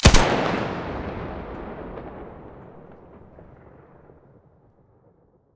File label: sa58 muzzle, weapons; STALKER; IWP